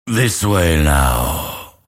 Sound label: move pain; grimstroke